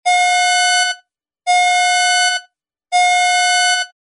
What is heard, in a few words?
alarms beep electronic caution